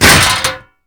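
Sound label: debris metal medium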